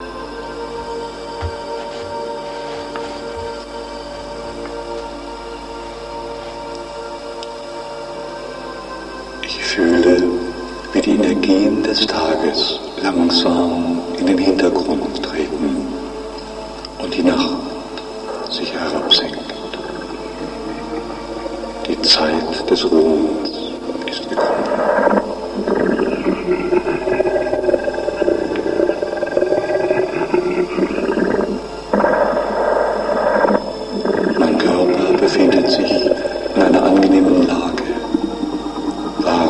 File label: meditation